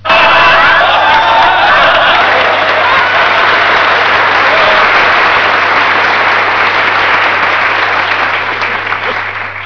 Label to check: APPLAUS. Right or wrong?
Right